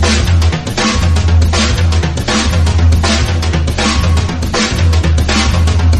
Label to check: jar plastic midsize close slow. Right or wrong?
Wrong